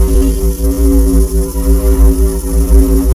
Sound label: heavy laser loop